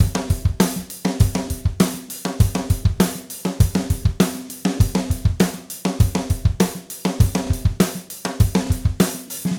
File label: Gretsch groove 90 BPM, 1967 Gretsch Kit drums; Sonic Foundry (Sony Creative Software); Vital Drums